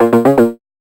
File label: tune